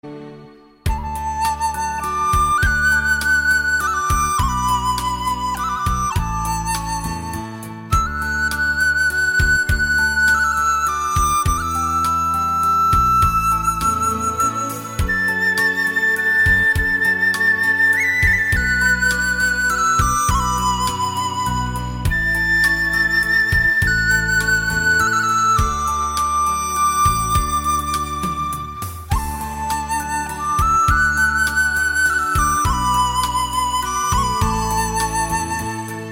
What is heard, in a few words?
Bird On Hazy Flute